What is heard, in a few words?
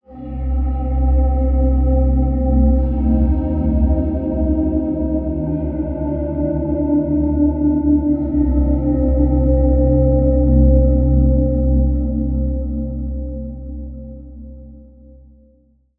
Pad, Spire; Consciousness Ink; Routed Sound; Spire Expansion; Quantum Enforcer Vol.1